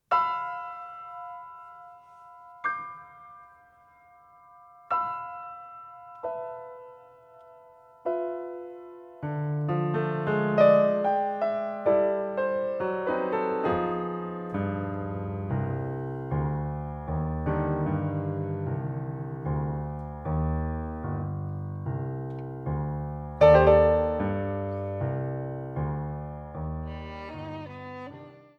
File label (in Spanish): Tango progresivo, Tango